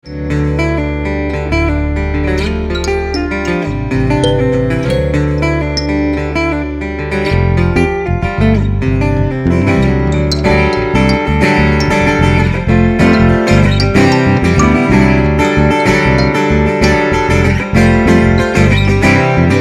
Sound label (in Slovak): gitara basa kalimba ukazka; Laymon